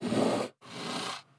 slide

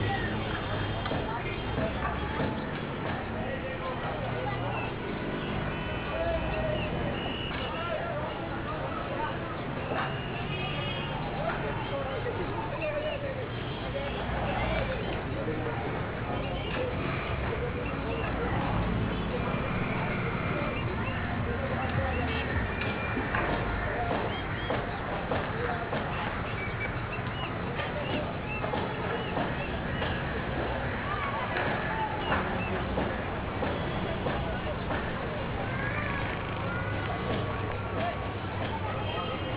Amb Calcutta; Street Cricket Champions; PSP

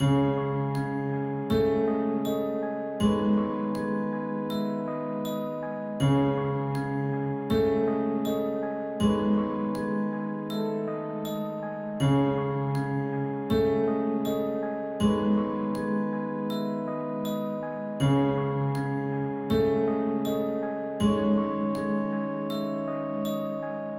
Song; CassowarySong BGM Main

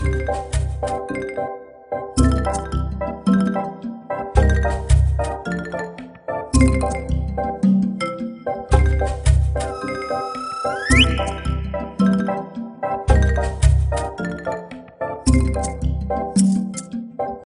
Jungle Level

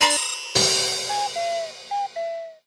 15 Seconds Alarm; Farm Mania

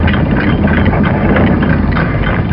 ELEVMOVE; blood fresh supply

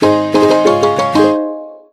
Jingle - Get Item; Pokémon Sword beta

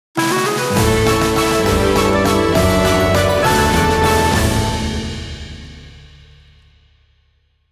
Power Activation; Advance Wars 1+2 Re-Boot Camp

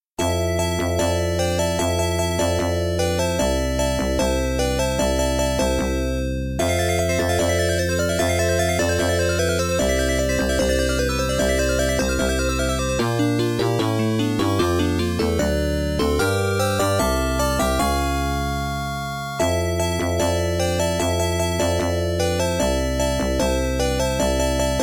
Key Item Acquisition; Star Cruiser; Sound of Arsys; Reviver

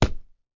collision; Angry Birds Space

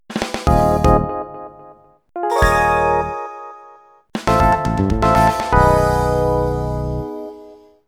Golf Jingle; Yakuza 2; PS2

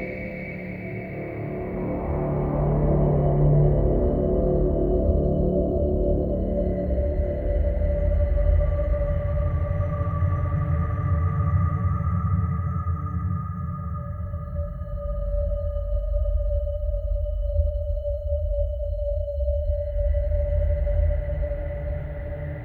Explore Suspense

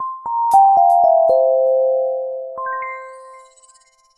Logo tune; 8bitboy

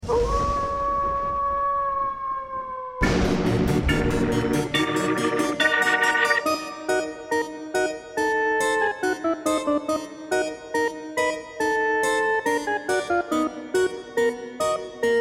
menu theme (brouillon); wicked witches

